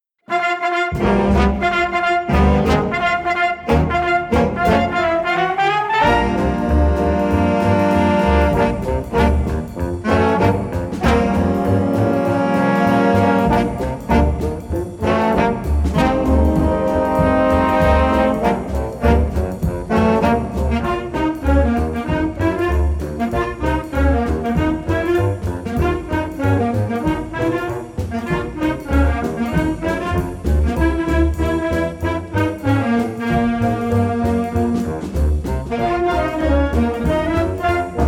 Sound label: Big Band Stomp